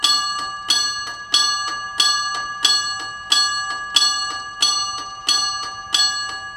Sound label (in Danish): Klokke bom